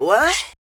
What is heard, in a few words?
Vox Chant